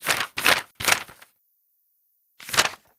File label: page flipping; Sorin